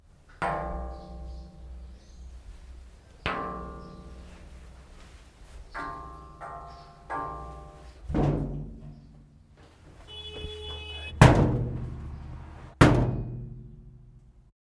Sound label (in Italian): suoni di ferro nel varco; abitanti